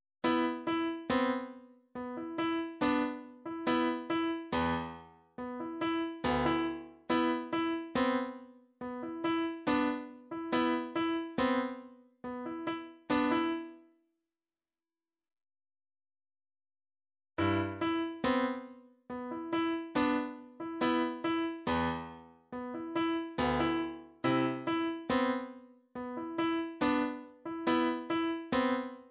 slow rock 70 bpm; dopehead

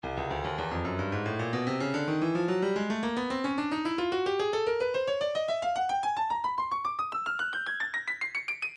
stylus breakbeat midi files 110 bpm; chuffed b

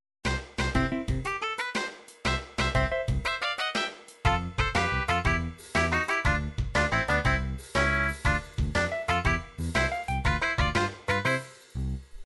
stage clear; super nintendo lemmings

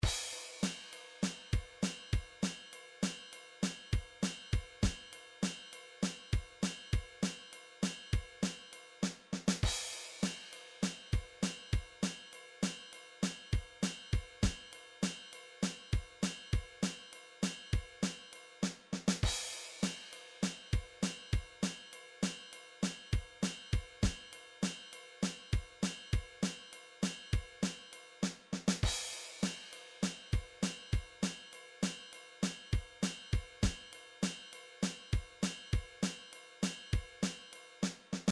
midi drums drums

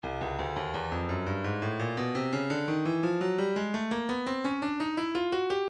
breakbeat midi files 140 170 bpm 170 offbeat; Stylus

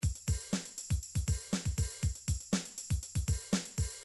midi drums dance

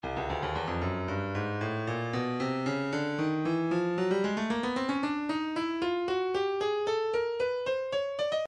breakbeat midi files 050 059 bpm; Stylus; 057 Isle A

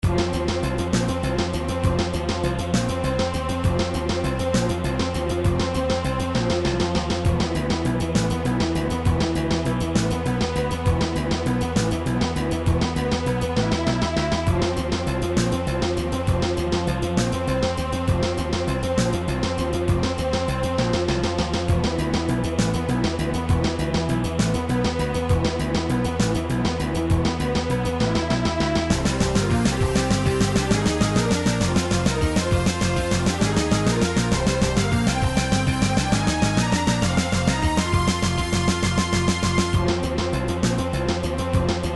boss music; nes life force

